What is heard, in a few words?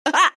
laugh; tinker